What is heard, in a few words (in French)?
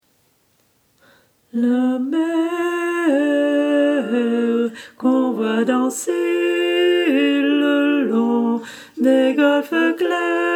basse